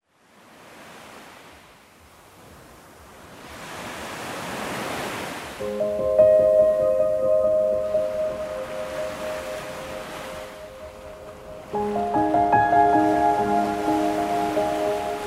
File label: - Wellness Ocean Waves